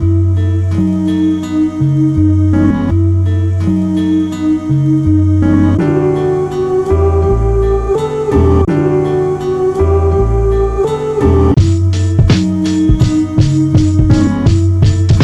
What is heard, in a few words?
Trap